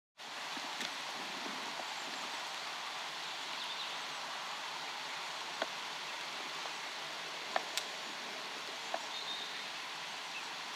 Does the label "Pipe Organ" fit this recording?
No